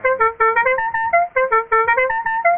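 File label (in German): melodie river-kwai, Hupen-Soundeffects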